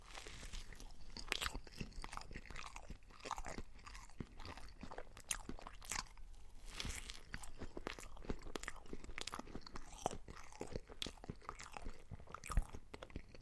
ya em myagkij penoobraznij desert

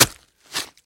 neterpelivij zvuk razriva bumazhnogo konverta

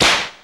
zhestkij udar knuta

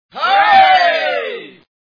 tolpa

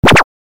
multyashnij knut

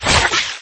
ataka zverya na detenishej

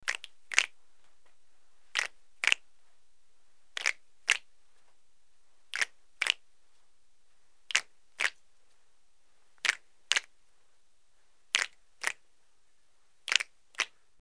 vsasivanie pitevoj vodi prerivistie, multfilm zvuk

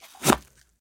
rezkij chyotkij korotkij zvuk votknutoj lopati v pesok

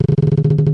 skrip odinochnii blizkii myagkii

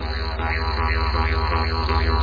didzherido korotkij motiv

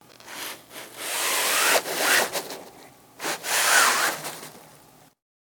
zhalyuzi otkrivayut

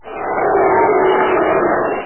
tolpa

